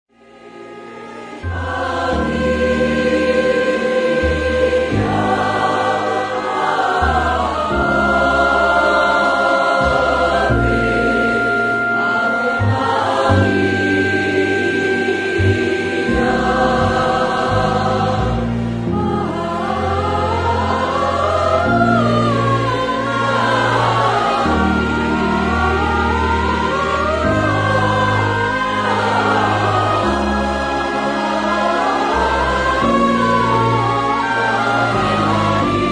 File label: SSAATB Solo; Ave Maria; Caccini